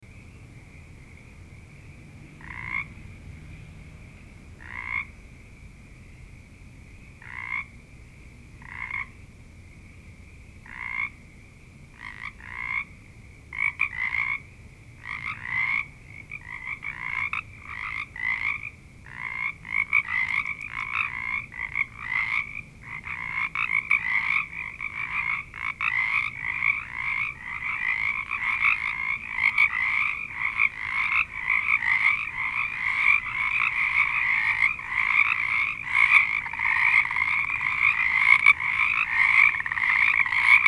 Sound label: Frog Chorus; Frog Chorus 01